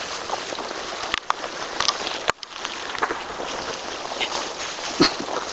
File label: possible loading rifle and shooting it; Gettysburg Pa Culps Hill